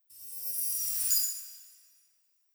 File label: finish close button